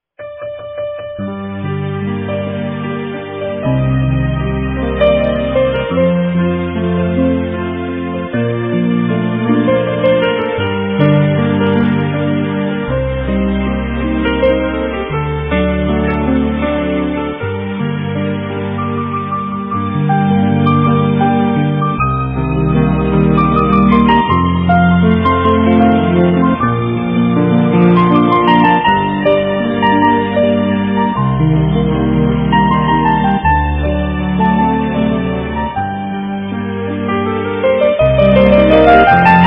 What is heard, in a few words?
INSTRUMENTAL PIANO